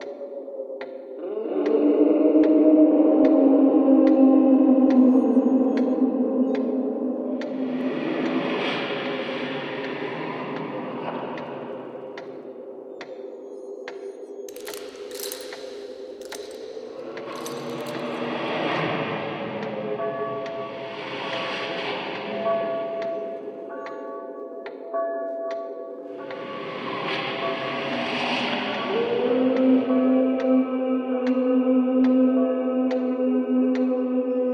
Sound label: Halloween Ambience